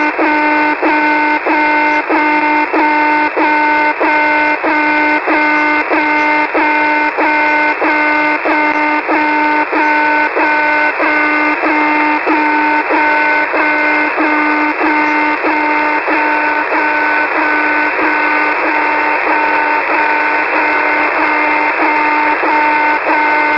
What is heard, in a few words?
Alarm, Russian military voice stations; 2019-06-20, 0917 UTC, 4770 kHz; Vega-33